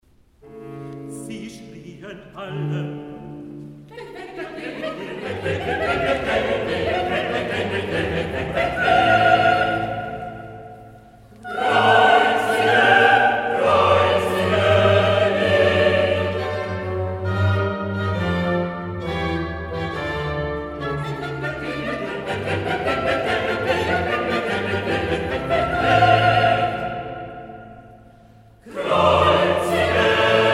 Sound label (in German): Recitativo Chor; Wegweg